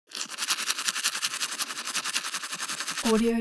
foley hygiene teeth brush wet open front fast; smartsoundfx

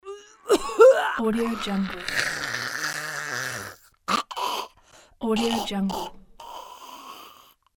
strangling sound

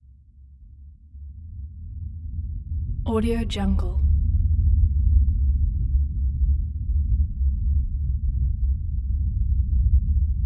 rumble whoosh subwoofer only rumble constant; prosoundfx

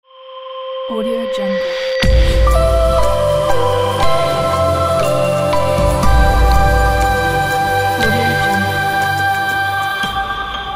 piano logo pack

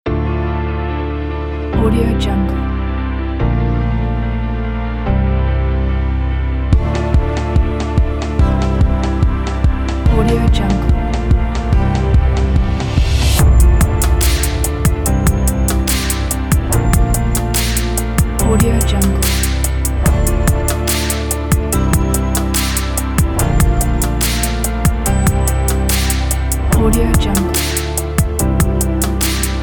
epic cinematic uplifting inspirational fantasy; mak-ryder

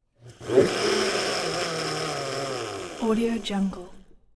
blender with shake

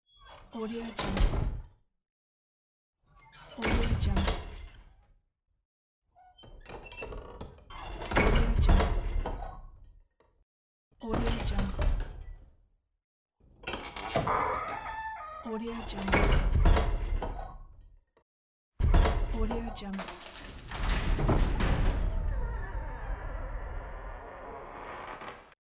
dungeon door

